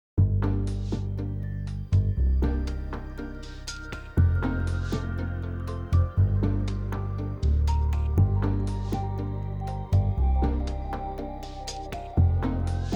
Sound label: Whisper Of The Palm Leaves In The Warm Wind